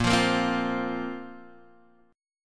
A sharp Suspended 2nd A sharp down Guitar Half Step Down